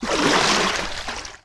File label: water run deep